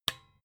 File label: desk lamp on